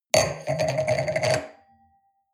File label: wine glass place table hard wobble; berufe; soundpool